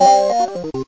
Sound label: Organ Lick Sound